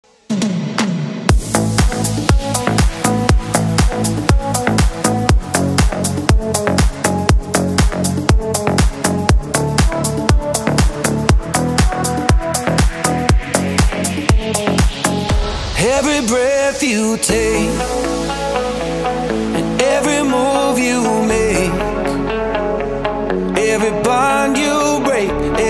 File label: saxophone music